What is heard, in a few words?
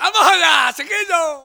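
rhythmic vocal; Best Service; World Colours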